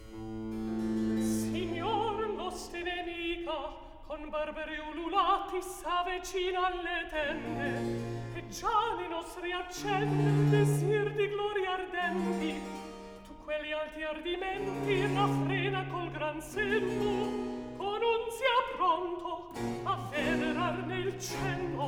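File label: Recitativo, Handel opera; Act 3; Signor, l'oste nemica; Handel - Rinaldo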